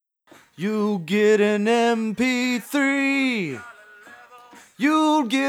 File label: vocals